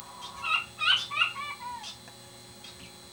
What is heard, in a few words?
Button Quail Crow; Birds